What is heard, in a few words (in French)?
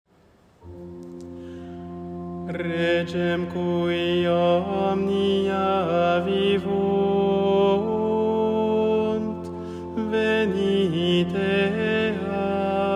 antienne invitatoire latin; office defunts